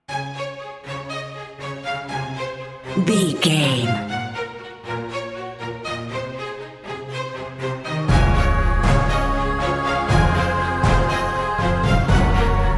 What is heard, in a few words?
sunshine morning cinematic corporate bed